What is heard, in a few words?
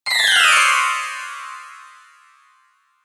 Bell DN